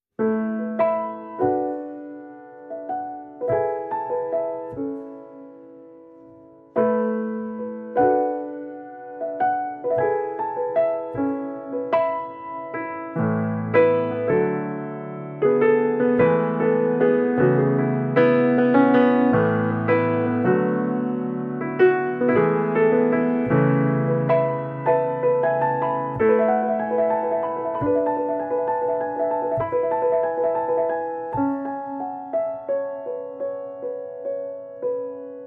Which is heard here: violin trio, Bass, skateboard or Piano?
Piano